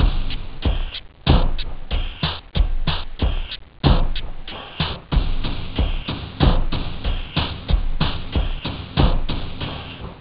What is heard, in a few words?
dance, house; OctaMED SoundStudio V1